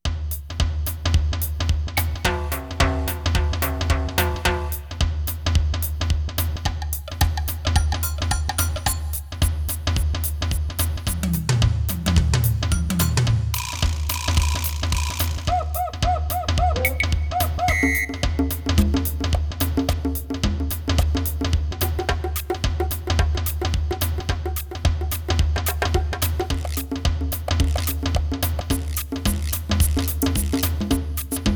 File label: Dog Beat; Freddi Fish and the Case of the Missing Kelp Seeds Remastered